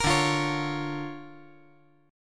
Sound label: D Minor Major 7th Sharp 5th D